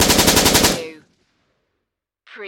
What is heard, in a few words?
Machine Gun SIG SG 551 Long Burst Close Perspective